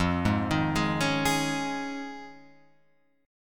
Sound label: F Minor 7th Sharp 5th, Guitar, Open F; Fm7#5 arpeggiated; 5,3,3,3,4,3